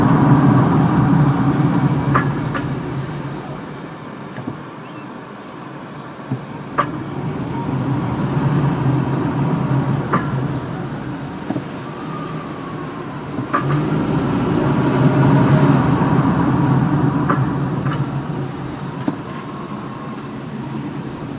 feh clicking sound when stopping starting, Ford Escape Hybrid